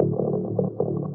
bass dark forboding, Loops, Phonky

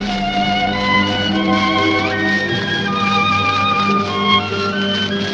strings, Sad; loops

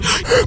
breath

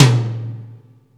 Toms

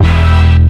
taped, stabs

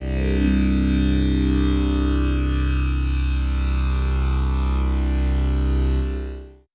buzzsaw, Synths; Notes

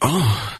"uh" orgasm; ooh ahh

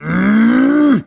growl male; ooh ahh

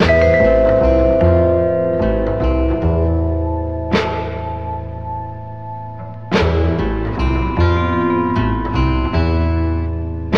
guitar

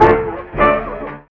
horns, Phonky loops

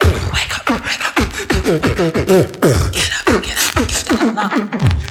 wak up get up, Beatbox; Drum loops

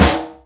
Snares, Real

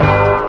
Stabs

